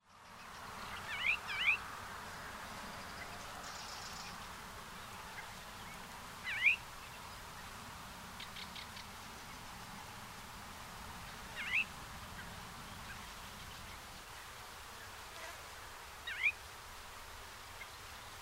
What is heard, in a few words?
crested lark